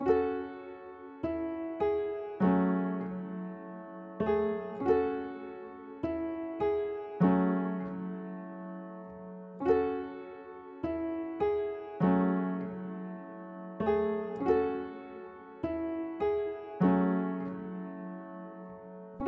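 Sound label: Afro Melody Loop F minor 100 BPM Piano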